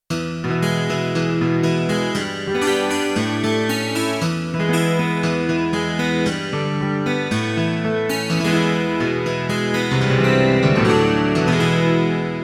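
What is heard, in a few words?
Roland D-70 12 string guitar; Factory Performances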